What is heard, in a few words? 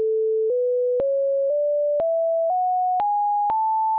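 just A do re mi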